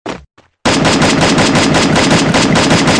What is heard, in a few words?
m4a1 gun sound